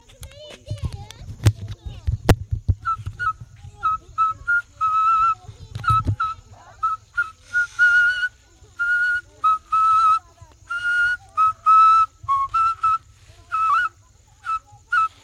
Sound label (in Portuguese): timbiras